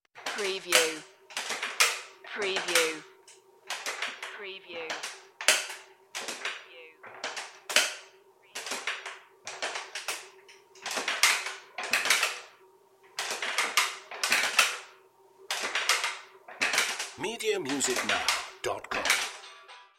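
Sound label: Creaky Rocking Chair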